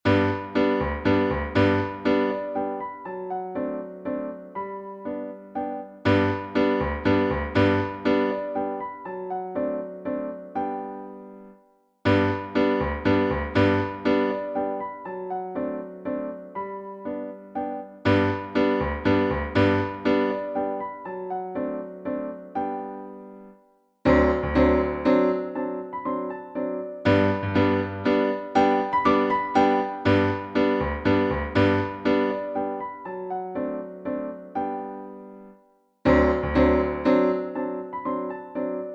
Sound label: serenade with drums and trumpets; oesten theodore